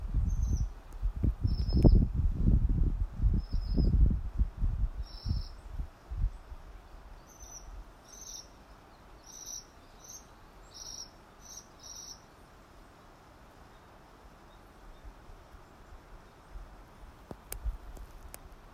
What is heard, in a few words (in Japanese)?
sansyoukui